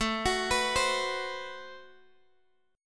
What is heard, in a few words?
A Minor 6th Add 9th A Guitar Open D